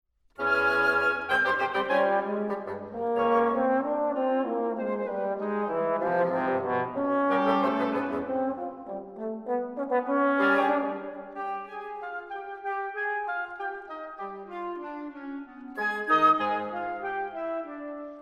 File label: Concertino For Trombone & Woodwinds, Soliloquy